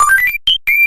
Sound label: all clear; tetrio